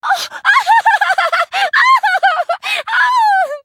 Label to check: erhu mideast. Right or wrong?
Wrong